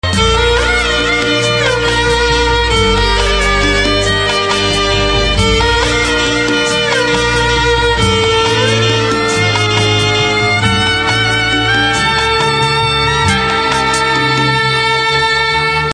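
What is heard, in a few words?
Sad background music, Telugu Ringtone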